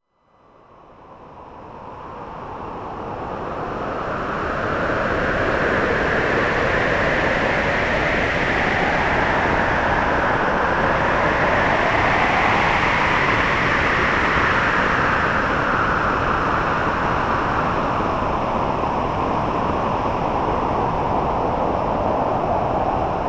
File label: stormy night